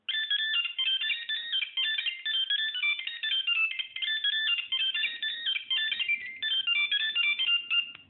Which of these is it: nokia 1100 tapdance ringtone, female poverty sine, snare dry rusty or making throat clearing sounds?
nokia 1100 tapdance ringtone